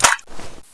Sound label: clip release; svencoop; echoes